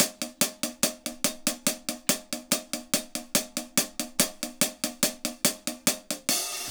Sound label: HAT, HI HAT LOOPS, Dub; PERC LOOPS; Drumdrops In Dub VOL-1